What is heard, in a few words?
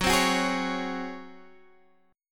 Db Major 7th Suspended 4th Gb